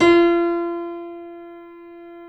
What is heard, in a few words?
C7 F, The Ultimate Piano Collection; East West